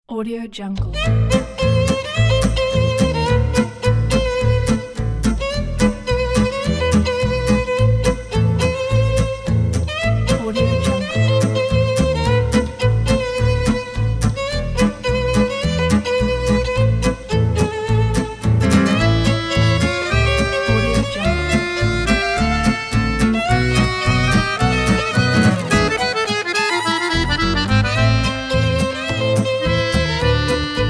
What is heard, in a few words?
gypsy jazz swing violin